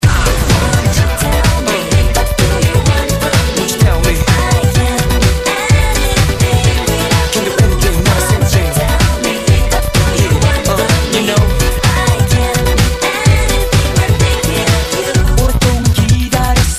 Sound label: Techno Remix